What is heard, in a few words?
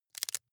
wood chip small break creak